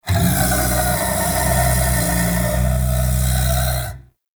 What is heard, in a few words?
Lure Call; Warframe; Undazoa; Hunting Critters; Characters